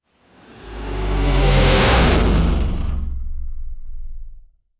scifi sound for a boss; boss fight; sound effect

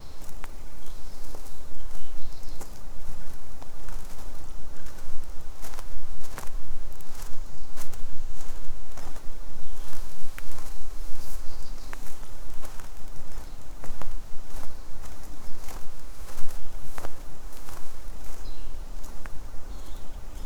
ambient forest wind light, bell tone

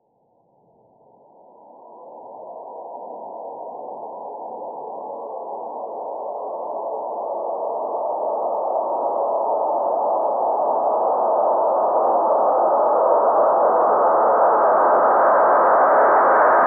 a quick flight through th

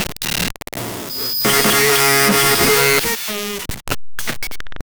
glitch swell hacker type

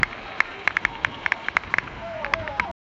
football pads popping sound, sports

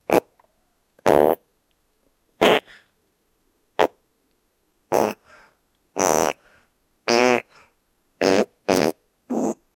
young man farts

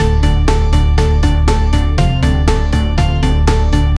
loopable epic, slot game; 4 second

